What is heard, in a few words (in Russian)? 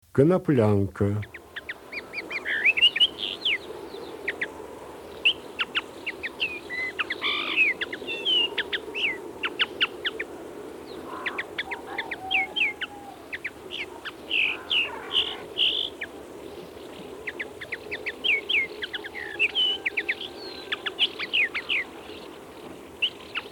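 konoplyanka golos, linnet voice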